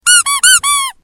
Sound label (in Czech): Sms piskatko